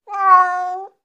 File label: Cat Domestic Meow Sad